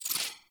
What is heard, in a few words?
Briefcase Launcher Aim Exit, Weapons; Warframe; Corpus